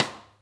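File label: side stick, Interactive Drum Kits; Sonic Reality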